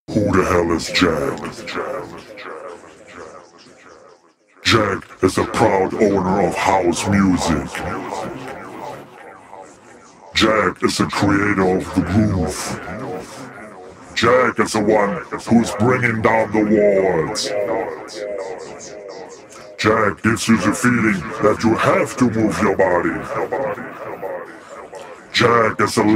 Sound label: Acapella